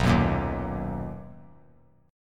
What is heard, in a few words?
B Major 7th B down; strummed; JGuitar